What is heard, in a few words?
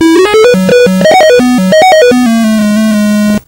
weird